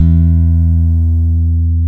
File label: E-2 I-BS, bass